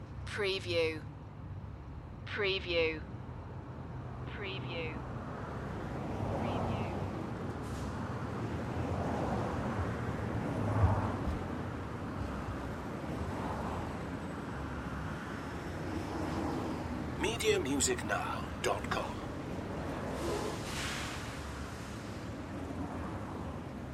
Urban Street Traffic Short

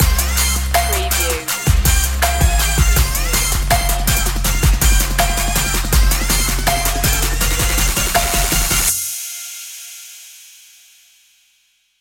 trap this

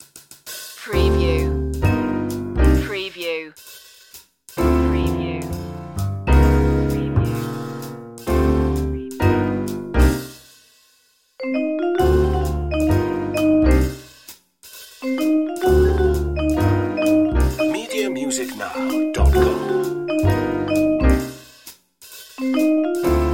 fresh jazz

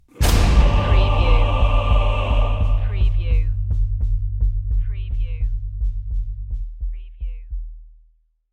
the dark path horror stab

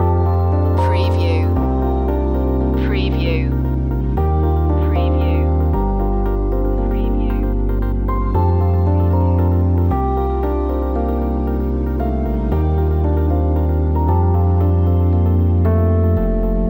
distance arriving loop

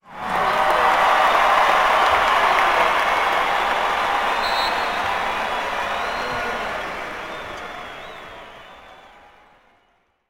Basketball Pro Crowd Cheering

